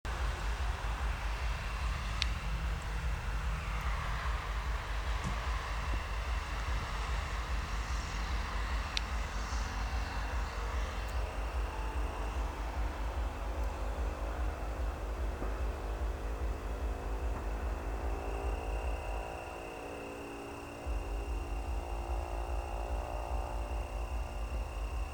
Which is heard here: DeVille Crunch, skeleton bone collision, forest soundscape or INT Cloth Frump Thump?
forest soundscape